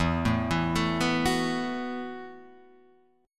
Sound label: E Suspended 2nd Suspended 4th; arpeggiated; 0,0,2,2,0,2; JGuitar